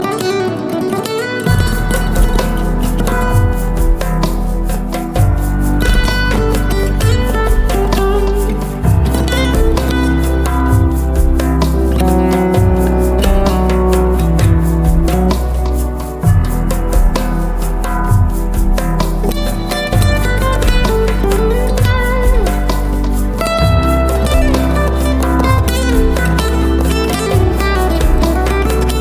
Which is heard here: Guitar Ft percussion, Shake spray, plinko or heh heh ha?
Guitar Ft percussion